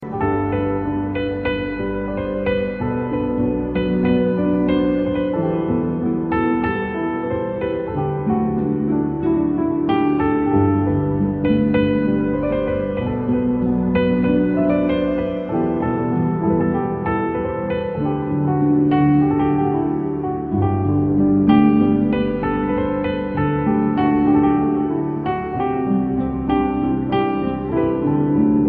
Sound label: nice piano, ringtone; blues